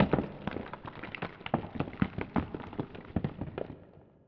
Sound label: rocks; The Elder Scrolls III - Morrowind